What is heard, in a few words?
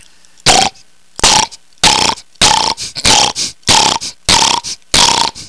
snort